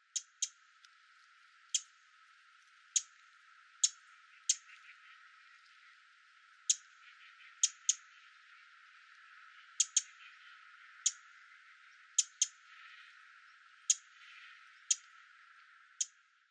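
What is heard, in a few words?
Eurasian wren call, sano; misosazai; koe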